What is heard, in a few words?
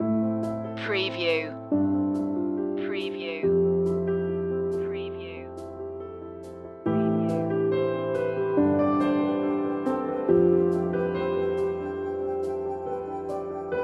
drops in time loop